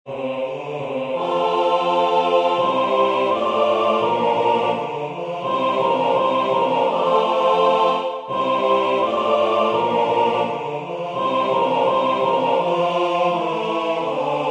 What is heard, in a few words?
MIDI SATB